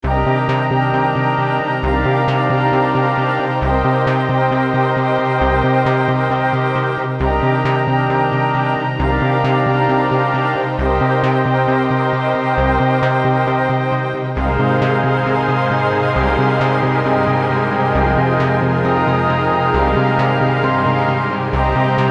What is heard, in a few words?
Sequence Flutes Orch; THE STREETLY TAPES VOL 6